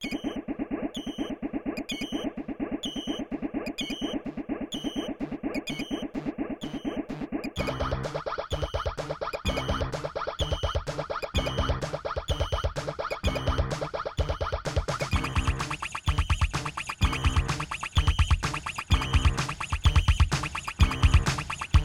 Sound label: intro, turtlebeach pinnacle; Soundcard test